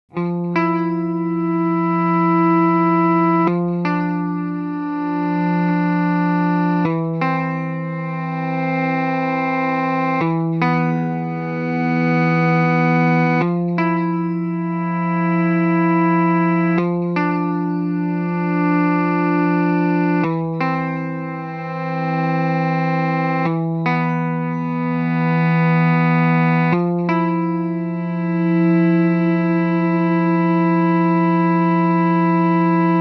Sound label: EHX Pico Deep Freeze Auto Mode u Clean Slow Attack